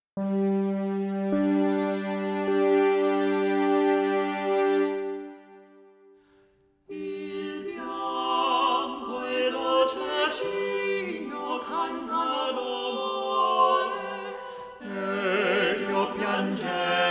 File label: minus soprano; il bianco e dolce cigno